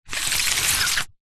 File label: office plastic document folder wallet push slide wood desk